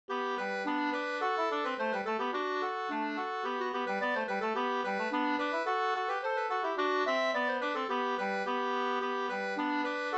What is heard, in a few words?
polka, polkett